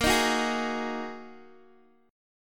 Bb 7th Suspended 4th Sharp 5th Bb down Guitar Open D